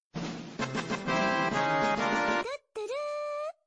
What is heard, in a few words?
victory tuturu